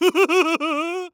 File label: Cartoon Human Male Laugh Hillbilly Laugh